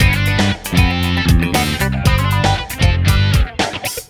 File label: funkrock, ringtones; Google; NewWave Labs